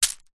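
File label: foley small stones many light impact ground; zapsplat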